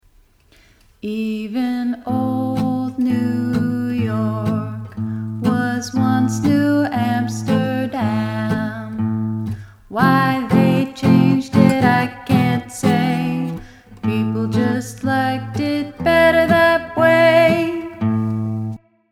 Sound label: chorus harmony c melody